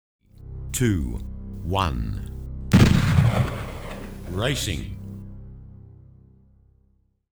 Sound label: scratch, radio controlled boats